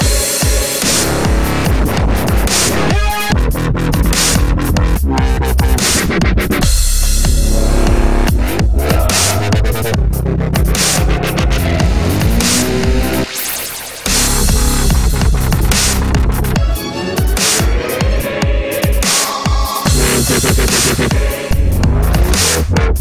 boss theme loop; svencoop; sence; source of life

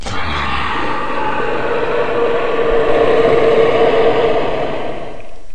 white tree scream; svencoop; source of life

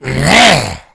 zombie attack; svencoop; justzombies

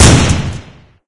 oicw fire; svencoop; hl2

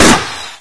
m41a, weapons; svencoop; st mission

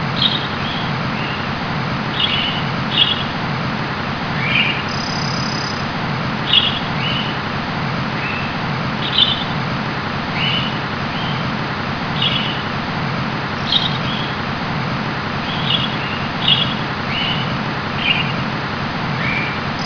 outdoor

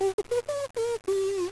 roach walk; svencoop; biglolly coop

